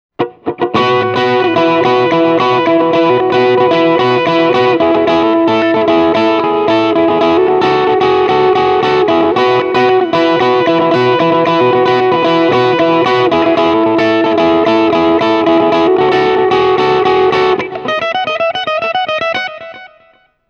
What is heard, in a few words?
dv mark multiamp